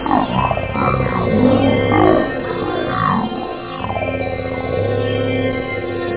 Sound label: 303 every; da capo vol1